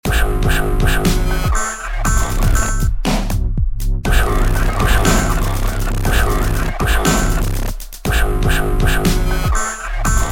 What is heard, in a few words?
dubstep